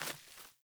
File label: Sandals Run Vegetation, Footsteps